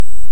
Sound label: Hydrophone; OneOcean